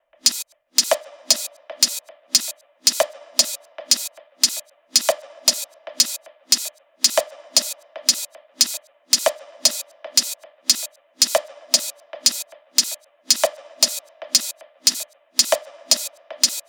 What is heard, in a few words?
tops 115, Drum Sample Pack Loops Top Loops; SD SEISMIC; Slate